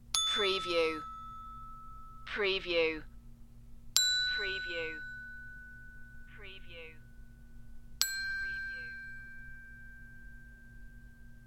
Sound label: Xylphn 8 Notes